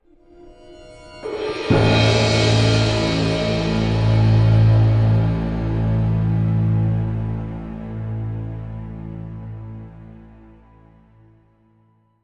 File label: intro music; dod carnival beta5